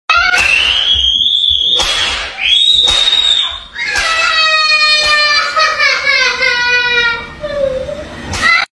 kid beat

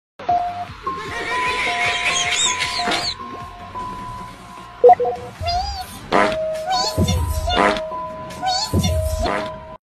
laugh fart

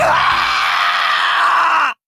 aaaaggghhhhh; tsukasa

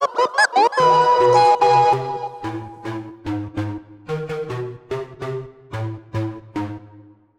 samsung ringtone; gemaakt door locked in quiri

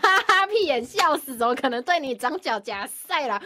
haha

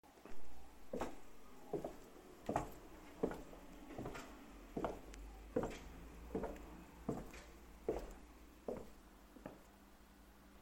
creepy footsteps

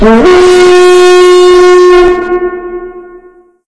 vikings horn with reverb

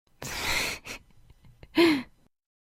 giggle; e girl

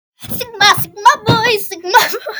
aaaaah

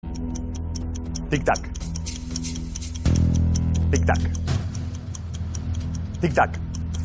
tictac

